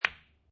Billiard Pool Snooker Ball Hit By Other Ball Medium; Sports